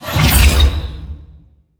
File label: Binding Spell; AudioWizards